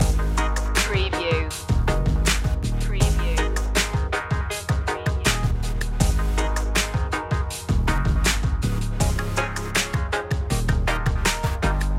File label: bold attitude loop